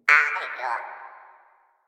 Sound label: Flying Robot Voice